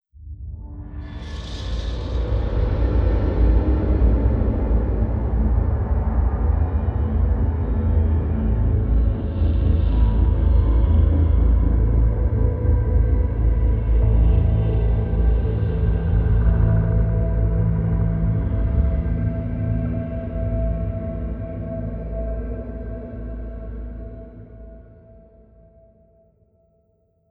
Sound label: Death Theme; blades of time; ps3; xbox 360